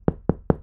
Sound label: notification; NCS